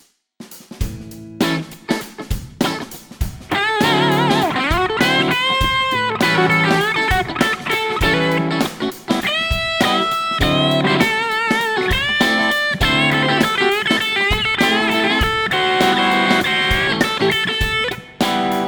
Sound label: funky; crumble